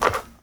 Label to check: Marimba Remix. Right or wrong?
Wrong